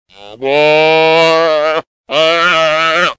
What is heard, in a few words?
Calf Distress